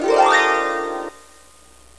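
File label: HARP GLISS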